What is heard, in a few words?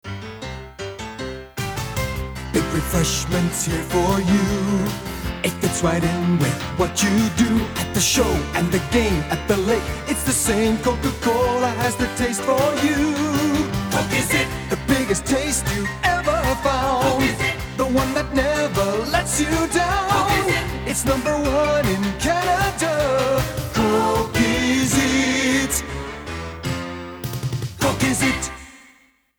Piano; COKE; MOR